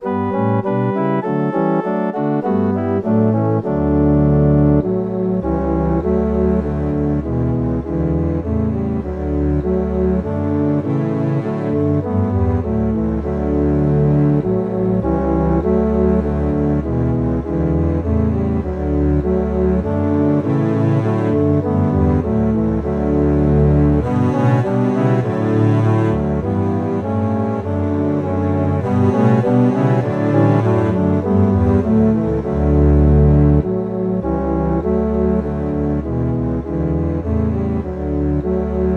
Double Bass Track with Melody

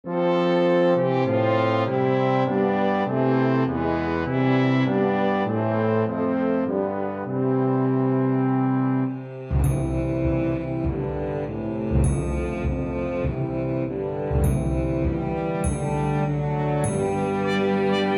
Cello Track with Melody; While Shepherds Watched

